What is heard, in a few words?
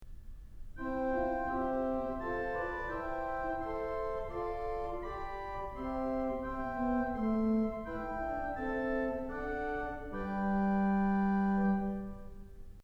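Great Octave; Kellogg Auditorium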